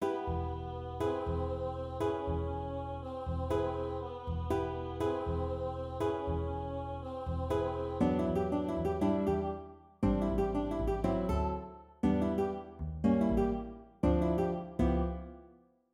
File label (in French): chorus sax bar